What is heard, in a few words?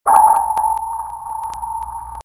pulse